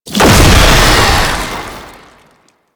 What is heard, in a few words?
Postule Explosion; Enemies; Lasrian Tank Infested; Warframe